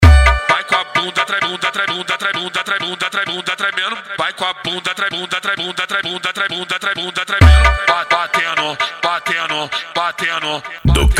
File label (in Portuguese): funk agressivo